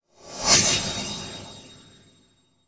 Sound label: Flash Frame Heavy; Title Sequence Effects